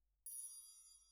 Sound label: Perc